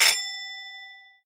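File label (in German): industrieklingel läutewerk; salamisound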